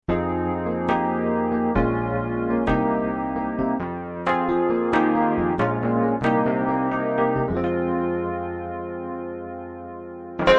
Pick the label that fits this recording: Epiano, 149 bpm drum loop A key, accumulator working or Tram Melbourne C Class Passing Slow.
Epiano